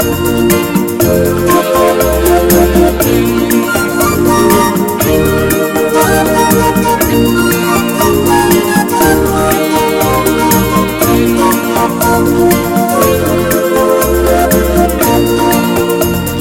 Fast Beautiful Guitar

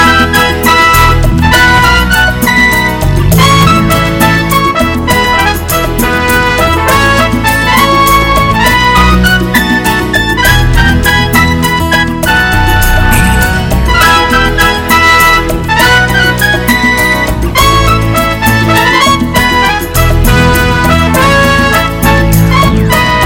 Six Eighths Slow Pop

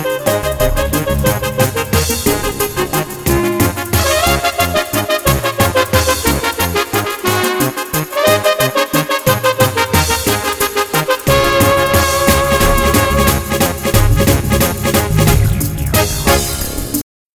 A Great Banda Song

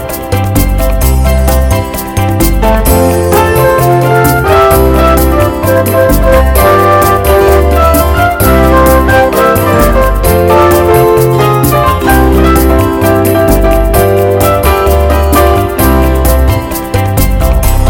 Happy Bossa Nova

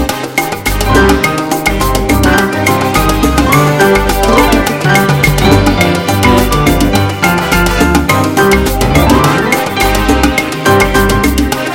Happy Mambo

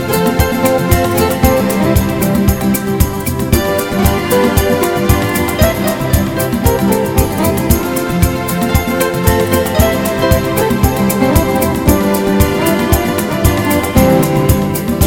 A Hot Bossa Nova

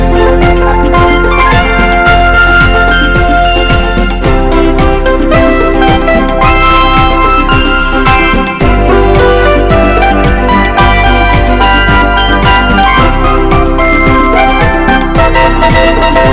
Great Smooth Pop

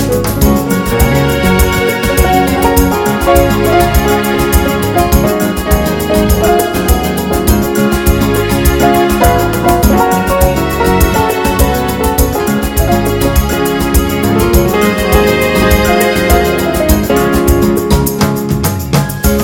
Samba from the South

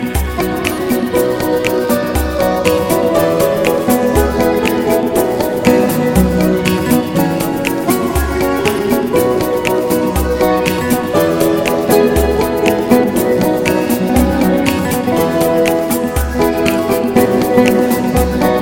A Smooth Unplug Song

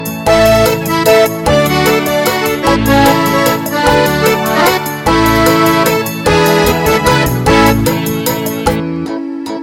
Super Italian Song

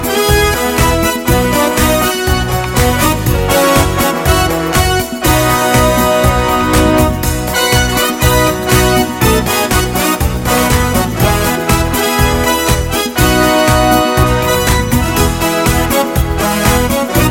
Hot Club Dancing

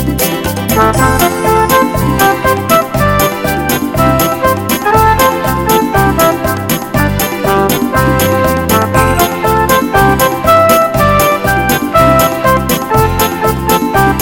Happy and Smooth Polka

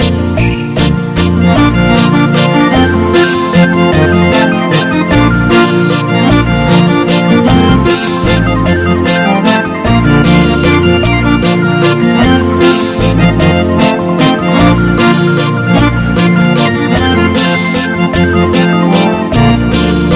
Traditional Italian Song

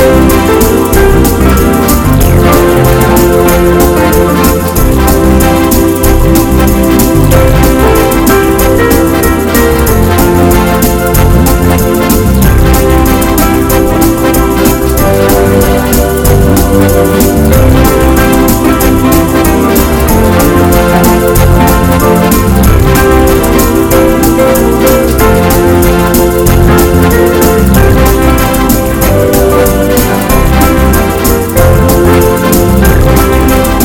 Hot and Fast Bolero

